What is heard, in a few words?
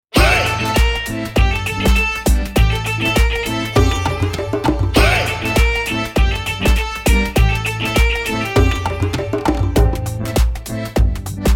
BHANGRA remix